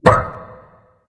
grunt jump; Smite; cerberus

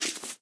rabbit; smite; medusa

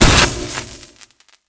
passive hit; smite; raijin

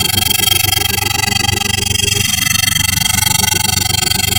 burn loop; smite; god daji skin15

